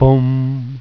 Voice Lo; Instrmnt; Sound Library 2000; Wayzata Technology

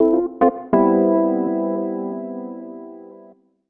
SYNTH HOUSE 125 A, Keys; eJay

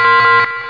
Alarm Sound; Missions of the Reliant!

